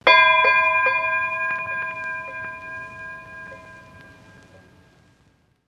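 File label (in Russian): классический старинный корабельный колокол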